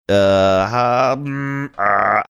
making funny thinking sounds

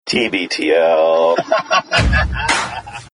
singing laughing; tbtl

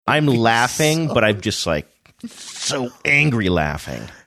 im laughing so angry laughing

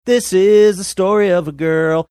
singing this is

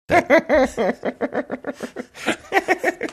cute laugh

